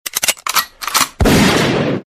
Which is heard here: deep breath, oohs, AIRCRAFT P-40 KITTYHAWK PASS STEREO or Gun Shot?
Gun Shot